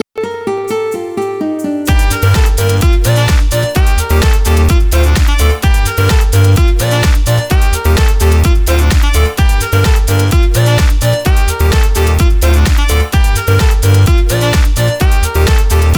perc

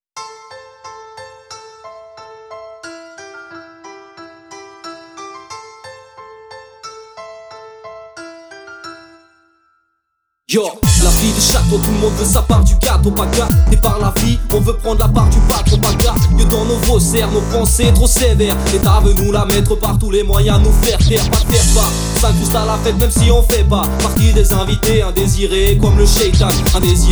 beat rap

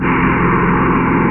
damage

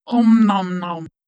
nom nom

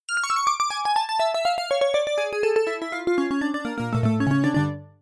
synth piano

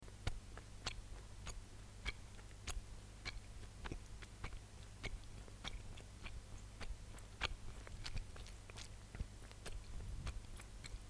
chewing gum mouth closed

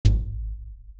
target Hit; shortbow

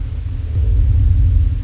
drone

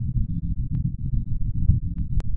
pulse

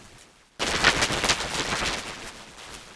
zap

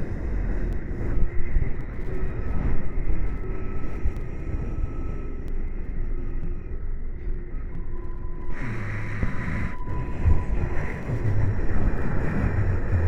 Noise; Rimac Noise 7

